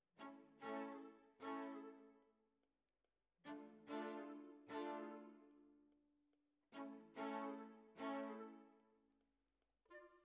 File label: Violas, jazz for piano